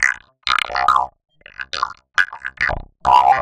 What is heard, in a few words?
gargle, Vocal Loops; Transmission-X